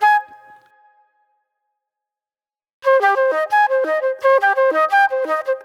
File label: Wild Flute